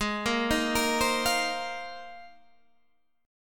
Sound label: Bb 9th Suspended 4th Ab, chord